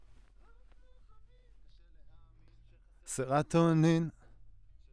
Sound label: Voc OD